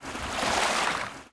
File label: shore, canals; Half Life Model Pack; Half Life 2; ambient levels canals